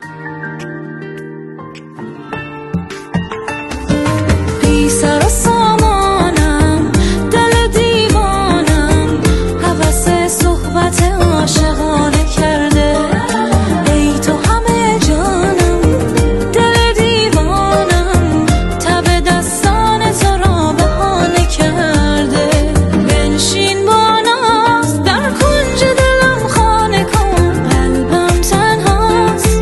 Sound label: Female Voice